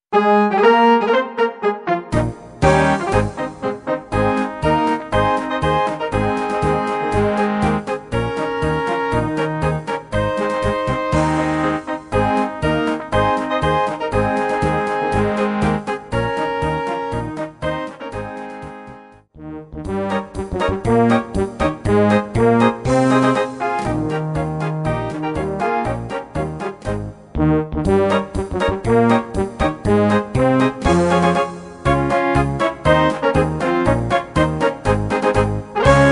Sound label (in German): Marsch